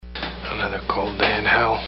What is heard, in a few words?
Scream